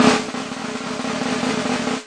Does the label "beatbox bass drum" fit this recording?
No